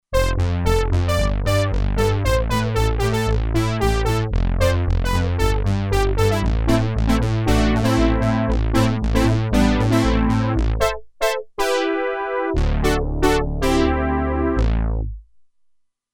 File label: Synth Brass, Roland Alpha Juno-2; Original Sound Chart patches